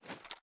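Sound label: sm reload xmag movement; gmod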